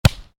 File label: Volleyball hit hard, Volleyball (Exterior): Hit Hard; Sports; Miscellaneous Sports